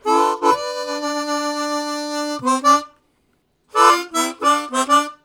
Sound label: Vamp Shuffle, Harmonica; Zero G